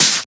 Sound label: Open Hat; FLPRO